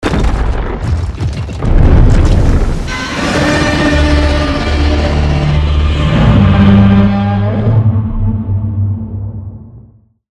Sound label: Breaks out of Ice